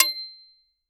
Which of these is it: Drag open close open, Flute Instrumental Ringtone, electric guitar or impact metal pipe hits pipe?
impact metal pipe hits pipe